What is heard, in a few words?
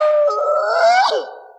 scream